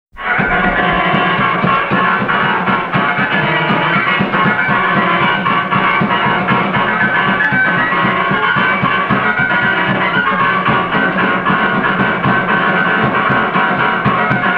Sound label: Keyboard Etude